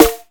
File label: nice snare drum a sharp key, snare drum sample tuned to the A# note